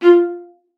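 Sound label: F5, viola spiccato; Xsample VOL-5; 90 s Sample CDs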